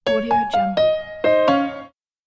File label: catchy brand piano logo